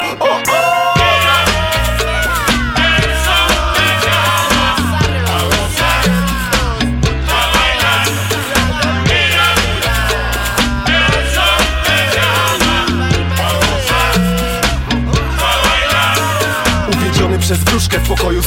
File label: Salsa